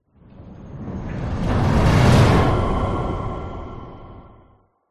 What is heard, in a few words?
whoosh; orlando lanzini